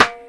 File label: Snare